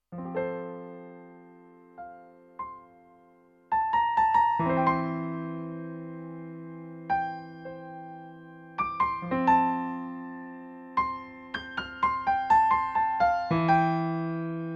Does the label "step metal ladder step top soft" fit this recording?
No